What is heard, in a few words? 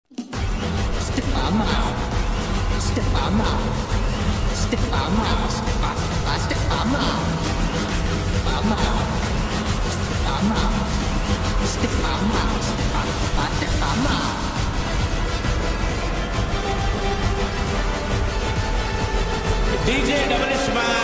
movie bgm ringtone; Double Ismart